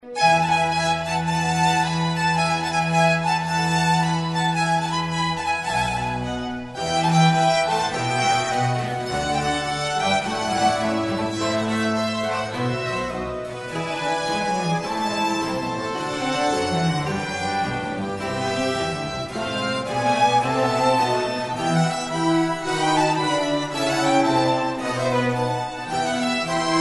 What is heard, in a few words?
vivaldi concerto violin string